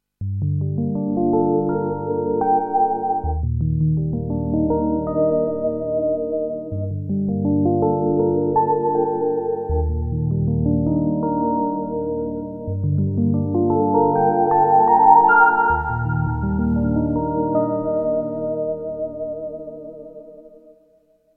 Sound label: Soft Pearls Velocity Foot Switch